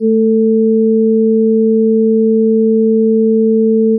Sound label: beat